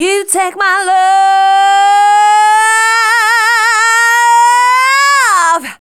FEMALE, Dance Vocals

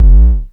BASS HIT, Dual Percussion Synthesiser; Klone

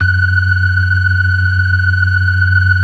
54 BLOOD R 1, ORGAN; Keith Emerson Signature Series

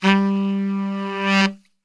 G 1 SAX, Brass Super Section; Best Service

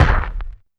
Kick, Alesis DM5; Drums

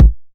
909 BD; Goldbaby Tape808 & Tape909; The Tape 909

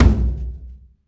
HYBRID, Heart of Africa; Spectrasonics; AF.HYBRID

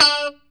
POP D 4, STRAT, Guitars; Spectrasonics; Hans Zimmer Guitars VOL-1; JC-STRAT POP

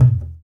Tablas; Spectrasonics; Supreme Beats; World Dance

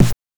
BANK1 SD, NES DPCM; MIDINES

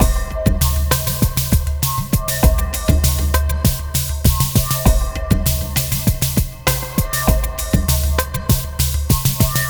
Ethnotronic, Processed Percussion; Sonic Foundry (Sony Creative Software); Rhythmicronics

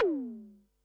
tom, Pearl SC-40 Toms

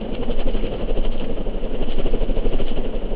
WHIRING; ENVIRN-AMB

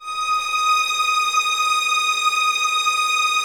Violins D#6, String Ensembles; Miroslav Vitous; 11 Violins

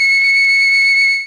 flute F, FLUTES; ProSamples vol.55 - Retro Sampler; ORCHESTRON

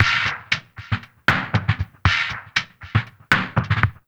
AQUAFUNK; Spectrasonics; Liquid Grooves 1 & 2; 117 AQUAFUNK

